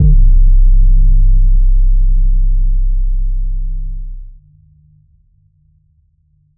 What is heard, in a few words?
Impact